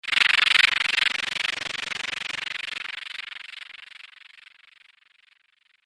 Rattling Whoosh

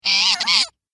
Weird Gremlin Phrase, monster; Monster Sound Effects

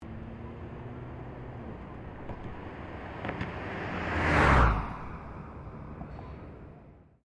Car Toyota Corolla pass, hatchback; 2009 Toyota Corolla hatchback; Cars (Sound Effects:Vehicles)

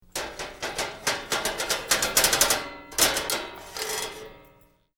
Metal Drag Scrap Clanking; Metal rattles from dragging a metal bar across metal ridges.; Clank Sound Effects

